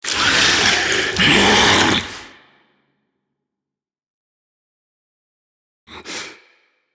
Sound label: Kiss Vocal; Eleanor; Warframe; Nineteen Ninety Nine; Quests; Romance NYE